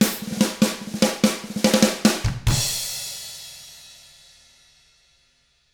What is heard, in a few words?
rock 6/8, drums; ILIO; Double Platinum Drums 1